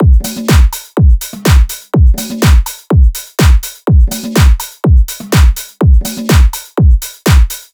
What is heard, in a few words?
drums 124, Full Drum Loops; Slate; SEISMIC Drum Sample Pack